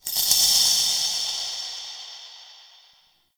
Interior Orbital Station Metal Shake; Warframe; Tau

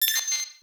Rail Jack Tech One Off

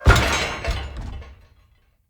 Pollution Tower Furnace Door Stop

